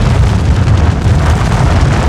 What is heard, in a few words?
Earthquake